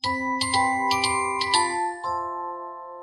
Sms Ringtone; Samsung Galaxy Tab S6 Lite

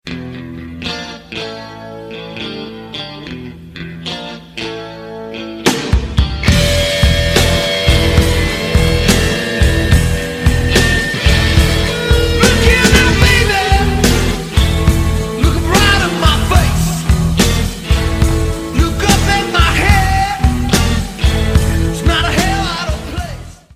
Action Theme Ringtone

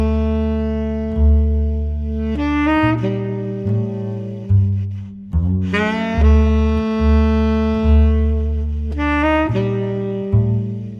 Melody Ringtone

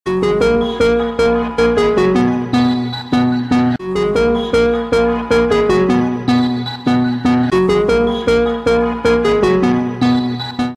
alarm ringtone

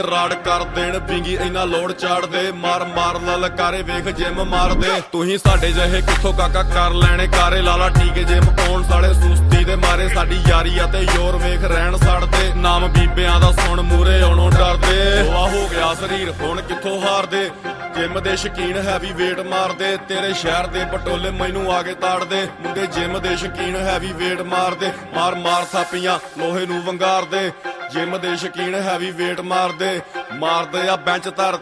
Punjabi Ringtone

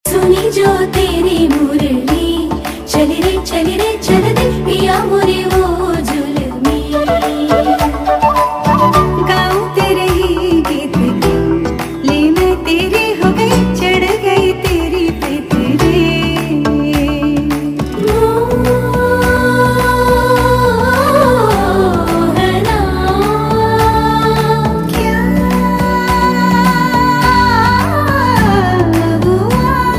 Bollywood Ringtone

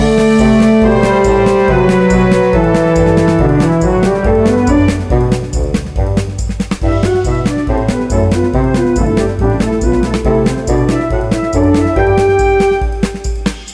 Vivo Y50 Ringtone

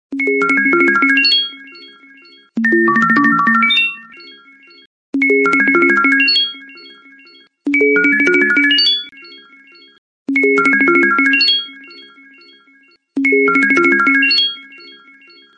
Alarm Tone

